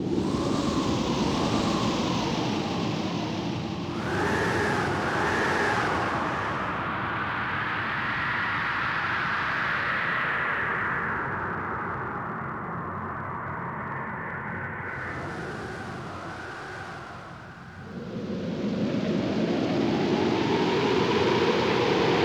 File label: Wind Ambience